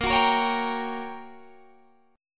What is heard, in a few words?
G Minor Major 7th Flat 5th Bb